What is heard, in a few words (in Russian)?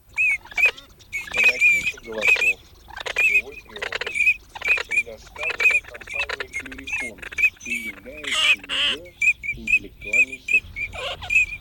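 Чирок-свистунок Alzavola, манок; plurifon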